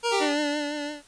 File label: closing inventory; GAMECUBE; LUIGISMANSION